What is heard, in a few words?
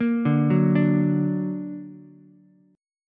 Bb Augmented Bb, Ukulele; arpeggiated; JGuitar